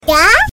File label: YAAA